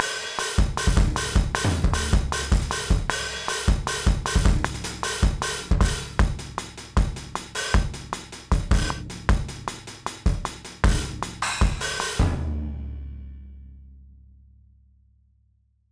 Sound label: GARAGE ROCK DRUMS SNARE MUTE